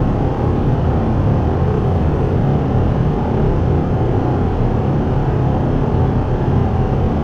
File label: Multiple airplane propels; HeneGames; Simple Airplane Controller